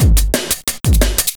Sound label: breakcore breaks